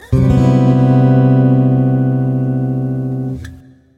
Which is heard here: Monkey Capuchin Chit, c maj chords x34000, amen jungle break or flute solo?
c maj chords x34000